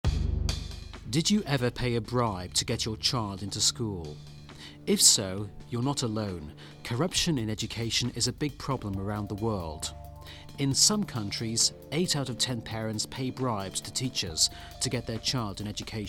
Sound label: Music